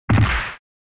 SNES Fighting Fall

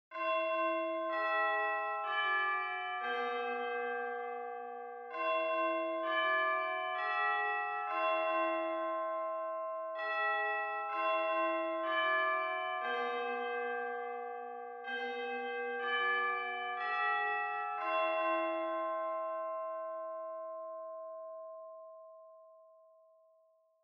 Japanese School Bell Far Slow Long

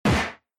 Hit

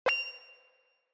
Onoma Inspiration Low

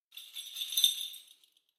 Kagura Suzu

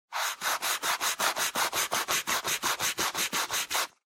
Eraser Rub

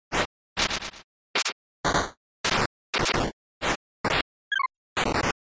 SNES RPG

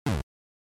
Block; NES

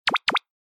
Motion Pop

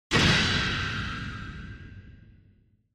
Impact Long; Onoma

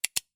Scissors

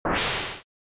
Shooter, Shoot; SNES

